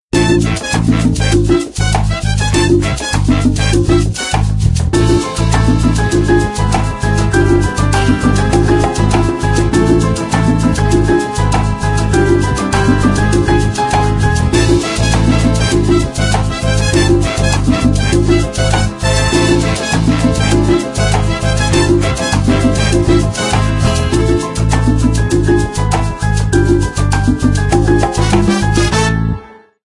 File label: Latin salsa